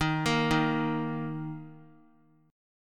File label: D sharp 5th D sharp; arpeggiated; x,22,25,25; JGuitar